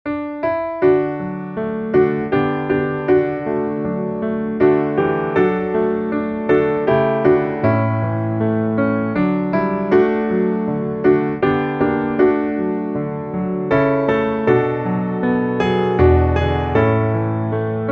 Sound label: piano